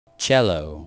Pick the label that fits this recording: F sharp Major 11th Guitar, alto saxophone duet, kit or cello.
cello